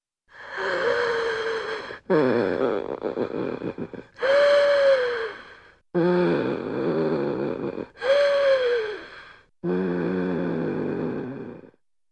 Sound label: Woman Snoring; Hollywood Edge Cartoon Trax